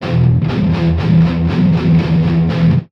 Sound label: Guitar NutCracker Intro